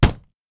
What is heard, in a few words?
door hit; fallguys